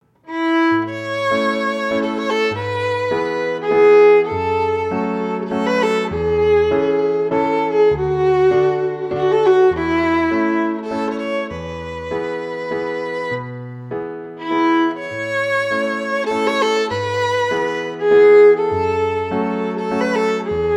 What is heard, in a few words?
Classical Piece